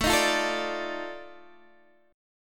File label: F 13th A, chord